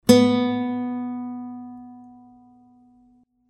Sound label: String Si B